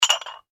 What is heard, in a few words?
household glass ramekins x2 clink; Clinks; Objects; McKinney Sound